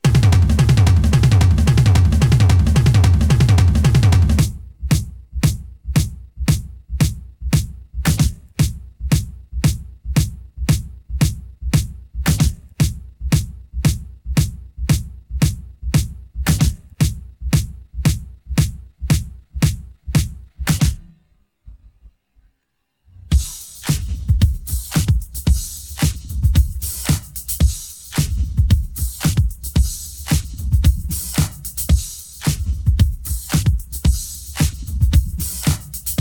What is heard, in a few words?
drums